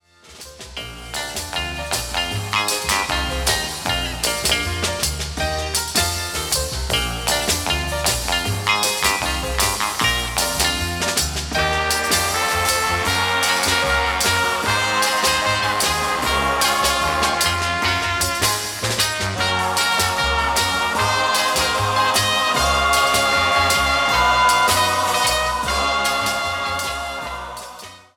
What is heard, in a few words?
Orchestra and Chorus